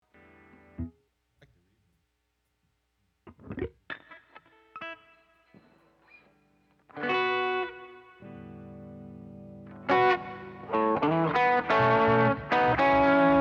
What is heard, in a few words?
Strings Emphasis Mix